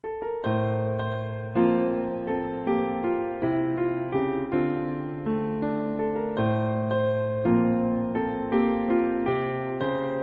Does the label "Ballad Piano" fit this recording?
Yes